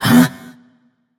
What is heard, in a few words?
surprised; smite; ares